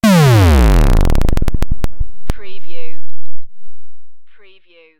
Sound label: Digital Alert Overload Downwards